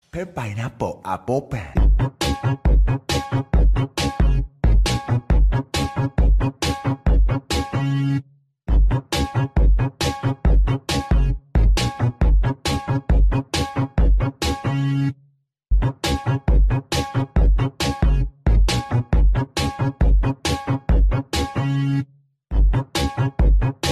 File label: oppo ringtone